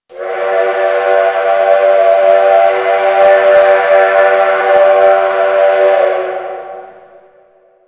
train horn; cstrike; kz ins trainrun

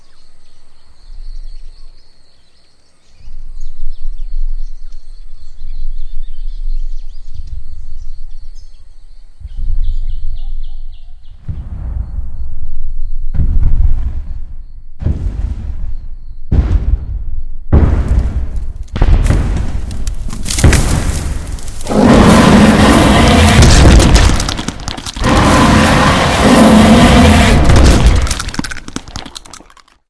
Birds; dinocanyon

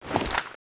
draw; weapons; balrog11; cstrike

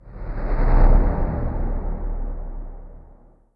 abstract hit; cstrike

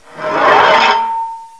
hammer draw; cstrike; jailaslt

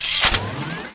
pistol draw, weapons; sfpistol; cstrike

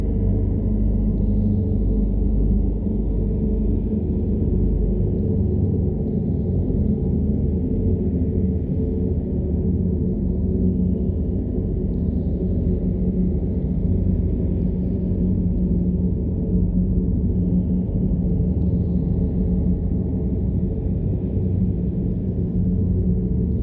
corridor loop; cstrike

